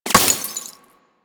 Laser Impact Glass Large

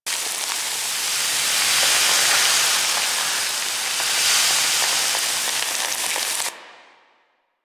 Acid Gas Loop; Warframe; Ambience; Corpus Gas City; Gameplay Remaster

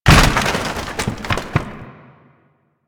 Wood Crate Destroy; Warframe; NineteenNinetyNine

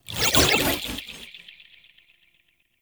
Power Shroom Proj Whiz By; Warframe; Nokko; Empowering Mushroom

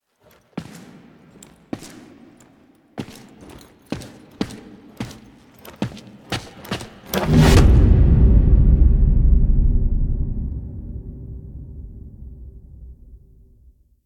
Mech Event Cinematic Steps; Warframe

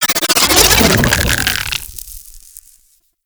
shock swarm projectile transform; Warframe; Infested; Shock Swarm Rifle

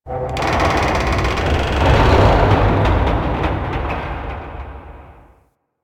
Base Large Metal Creak E; Warframe; Ambience; Star Base Intro

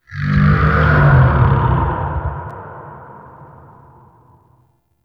Mystical Tree Creak, Wetland Forest Day; Ambience; Midrath

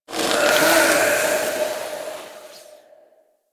Infested Pistol; Warframe

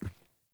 Grunt Boot Walk Default; Characters; Enemy; Melee Grunt; Footsteps; Boots; Sinecure

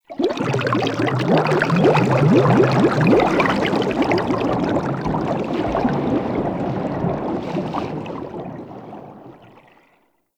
Movement Bubble One Off; Warframe; Vehicles; Sharkwing

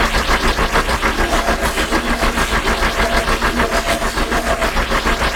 Crp Ship Spinning Power Core Loop; Ambience; Props; Warframe; Corpus Ship Remaster

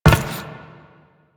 Step Metal, Footsteps; Warframe; Teshin

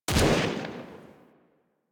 Fire Shot, Weapons; Warframe; Braton

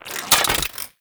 Spear Equip, Weapons; Warframe; Corpus; Spear Gun

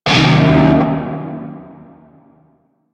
Shawzin Blues Chord D; Warframe; Narmer Shawzin

